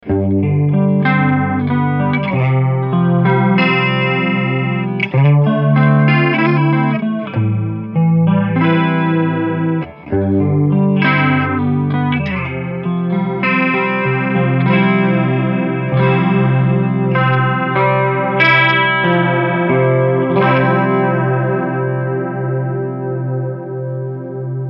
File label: Mode Normal Amp Clean